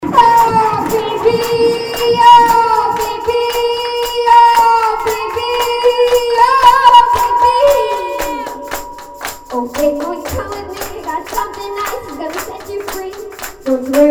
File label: Handclaps